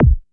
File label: RM1X Drum Anlg Kit BD Ana Hard; Yamaha RM1X